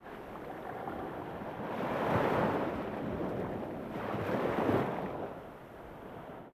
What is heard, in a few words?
ambient port default; World Of Warships